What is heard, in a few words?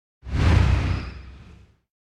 Whoosh By Air Classic Passing; Hollywood Edge; sound designer's toolkit v2